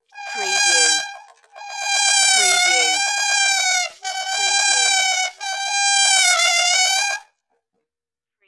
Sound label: Sax Mosquito